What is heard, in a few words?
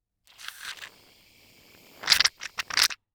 Shaving Cream Dispensing Cream From Can, Household